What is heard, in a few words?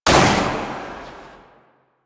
Shot, Pistol; Warframe; Weapons; Tenno; Priest; Knell Prime